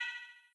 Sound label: On Button Cancel; mage client; xmage